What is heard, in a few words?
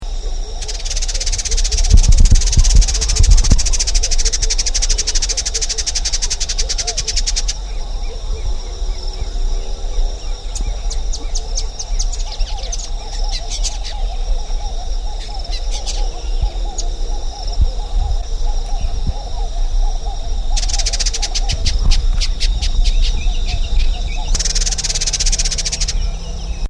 Vidua wilsoni; Indigobird Song